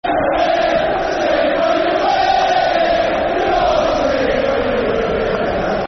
Sing When You're Winning [Football Chant (Soccer Song)]; Blackpool Bfc